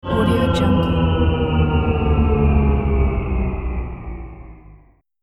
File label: the dark descent